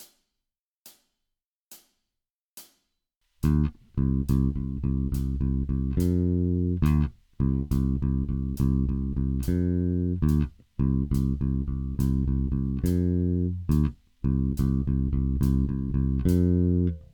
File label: Heavy rock blues, baixo; heavy rock shuffle